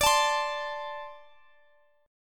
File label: D 5th 7th D; D57 Chord {X X X 19 22 20} strummed